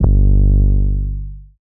808 Bass Warm G3; Slate 808 Deep Dive; SD Signature 808s

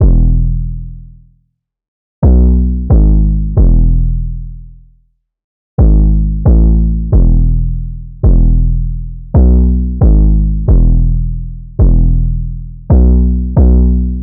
808 loop 135 E; Slate Digital BASSHOLE Sample Pack

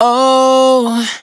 "Oh", adlib, Dry Vocals; Fm; Raw Vocals; Slate Digital Omnivox Vocal Sample Pack; Adlibs

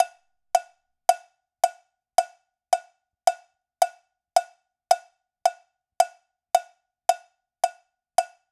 cowbell loop, 110; perc construction loops; SEISMIC Drum Sample Pack; Slate

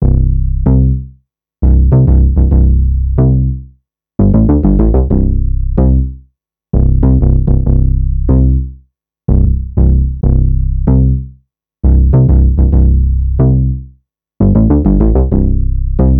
Synth loop 94 C; Synth Bass Loops; Slate Digital BASSHOLE Sample Pack

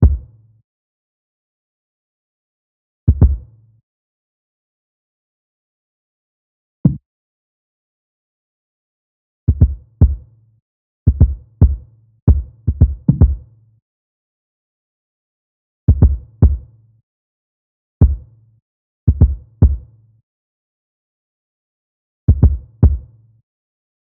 kick 75, Kick Loops; drum sample pack; Daisetsu; SEISMIC; Slate

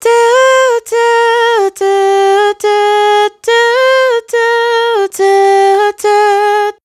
riff Do Do 140 Ab, Vocal, Dry Vocals; Raw Vocals; Slate Digital Omnivox Vocal Sample Pack

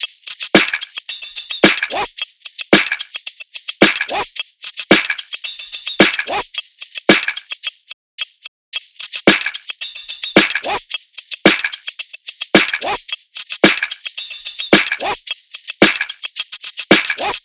tops 110, Drum Sample Pack, Loops, Top Loops; SD SEISMIC; Slate

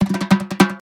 perc hand roll; one shots; SEISMIC Drum Sample Pack; Slate

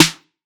snare fat; one shots; SEISMIC Drum Sample Pack; Slate

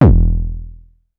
Synth oneshot Short E, Synth Bass One Shots; Slate Digital BASSHOLE Sample Pack

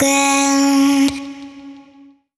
Vocal one shot Db; Slate Digital Omnivox Vocal Sample Pack

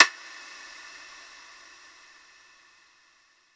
perc shimmer; Drum One Shots; SD MIDNIGHT Sample Pack; Slate

